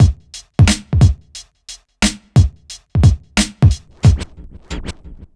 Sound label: 90 BEAT, Hip Hop R n B; USB Soundscan vol.19; AKAI; HHFX 89